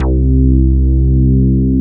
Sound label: REZOBASS